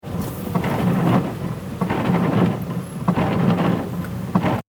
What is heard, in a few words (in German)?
Auto Scheibenwischer